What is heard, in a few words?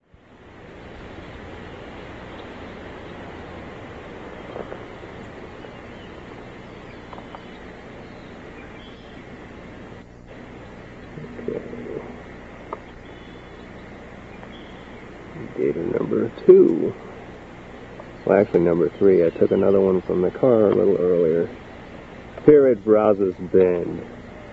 gator